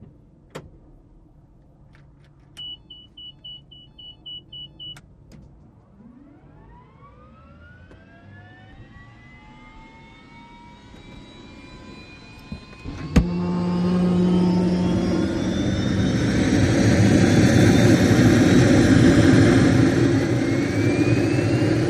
OH6 Loach Interior, little bird

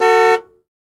Jeep Chrke Horn Honk; jeep cherokee 84

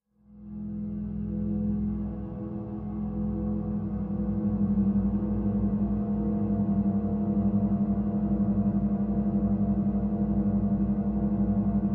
Drone Eerie Deep; pads

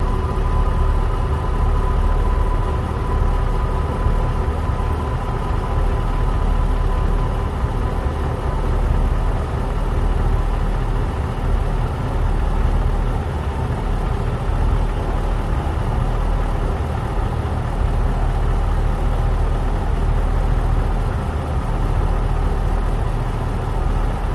Boat Engine Idle

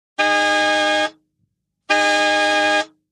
1940 Car Horn Small

